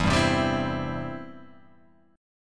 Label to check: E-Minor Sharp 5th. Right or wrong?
Right